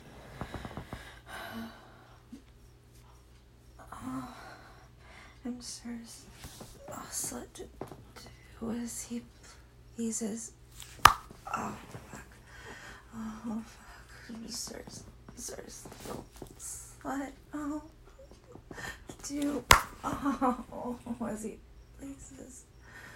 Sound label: Slap